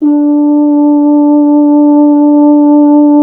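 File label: BRS F.HRN, Solo Instruments, French Horn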